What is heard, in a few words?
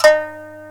shamisen D2#; AKAI S6000 CD-ROM - Volume 1